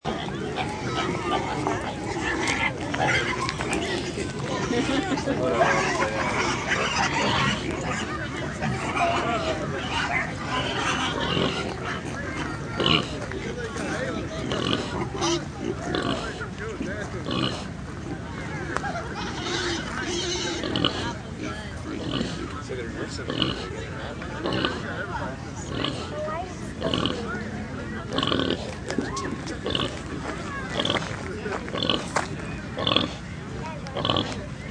County Fair Pigs, County fair ambience with pigs snorting.; Sound Effects:Animals